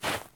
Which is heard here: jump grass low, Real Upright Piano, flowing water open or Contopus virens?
jump grass low